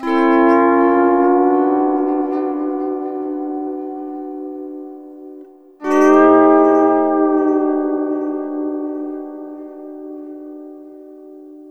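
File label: Sunrise Guitar Chord F#, Lounge; Zero-G Kocktail Kollection; Construction Kitz (Lounge); Sunrise Mixes 082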